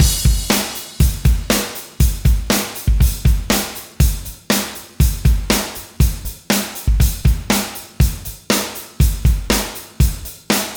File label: 120 live metal drums